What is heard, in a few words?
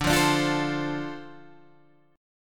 D-Minor 7th D Guitar Open G, chord